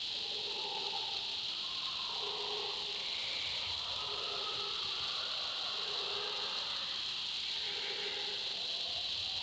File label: amb swamp; naboo; Star Wars Obi-Wan; XBOX